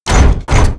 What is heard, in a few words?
Run; ArkAstron; Gamigo